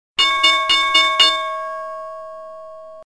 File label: Bell; Thinkorswim